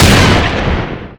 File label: autoshotgun fire; serioussam2